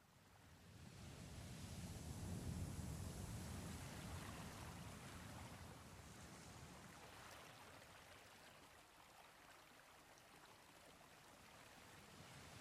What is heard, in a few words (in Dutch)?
Zee, stromend water, vogels en muziek